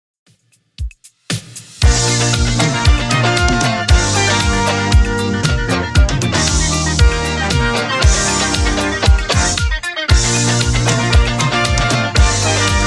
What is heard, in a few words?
70s Redrum 116bpm